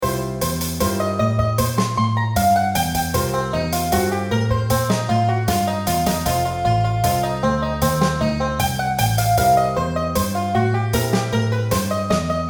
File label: MIDI blues; spellman studio